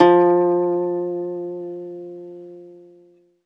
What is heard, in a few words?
ZITHER F, Alpine Volksmusik